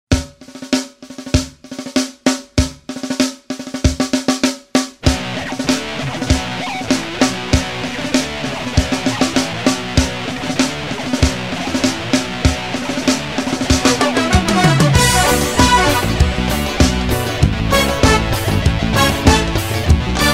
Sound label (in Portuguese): Berimbau metalizado